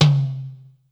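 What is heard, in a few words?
tom, Casio CTK-5000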